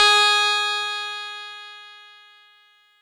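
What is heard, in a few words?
Fuzz Clav DX100 G#0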